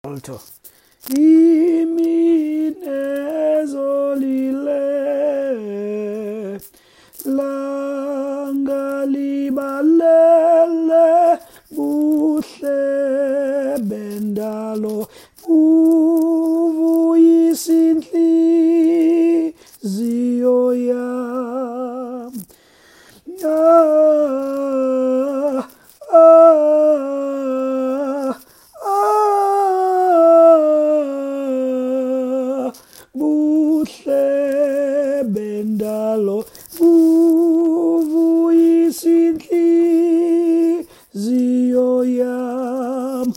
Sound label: alto